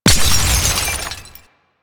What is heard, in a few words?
Glass Ring Partially Destroyed; Warframe